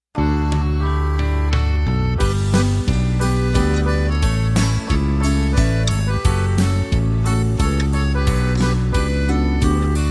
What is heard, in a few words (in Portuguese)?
Ao culto não faltar - Harpa Cristã